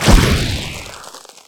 iceblast; weapons; dota; ancient apparition

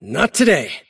deny pain; davion; dota

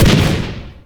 missile impact; tinker

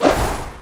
sword swing; dota; dragon knight

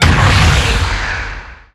gyro stun; weapons; dota; pangolier

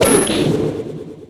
searing chains target; weapons; dota; ember spirit

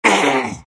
big pain; Undying

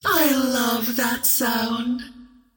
attack whip; queenofpain

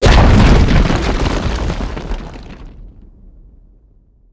tower destruction; building; damage

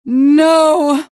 pain death; queenofpain

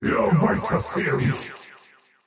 cast pain; dota; faceless void

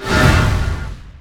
dragontail cast; weapons; ultform; dota; dragon knight

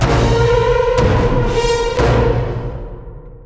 stinger; radiant hallow; dota; conquest

